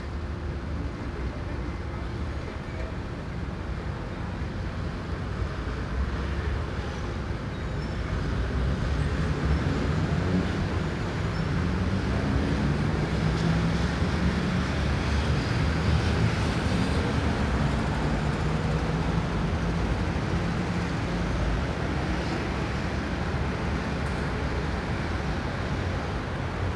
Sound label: ambience; Street Hoops; XBOX